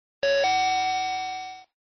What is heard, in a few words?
coin